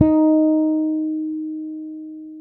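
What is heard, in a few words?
D#5, fretless, bass; Spectrasonics; Bass Legends CD 1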